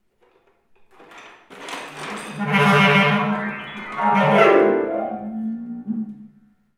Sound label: improv jingle